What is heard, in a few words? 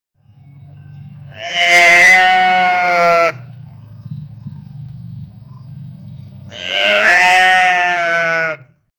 sheep and goats, baa